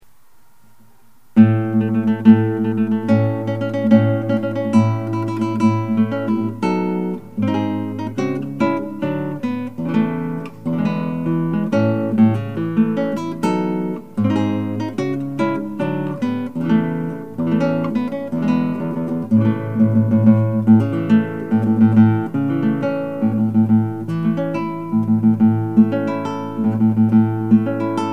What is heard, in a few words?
Acoustic Guitar; Mendelssohn March